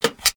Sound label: Stapler Button Sound